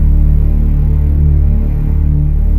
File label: SYN Ethnic; Best Service Gigapack 1